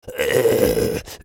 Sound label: dying; Fiddlesticks